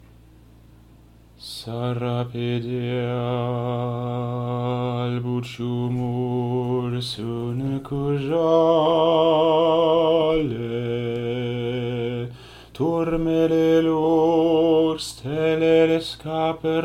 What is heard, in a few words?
sara pe deal basses